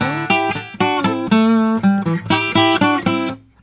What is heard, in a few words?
Blues Guitar Samples; ILIO; Fretworks; LICK